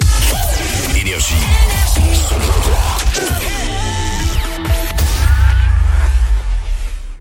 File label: JINGLE; PARIS, 100.3 FM; NRJ